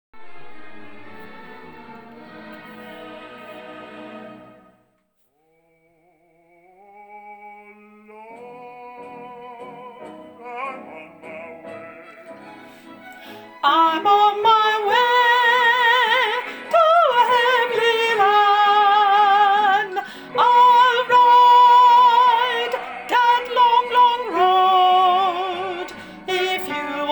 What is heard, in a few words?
sop 2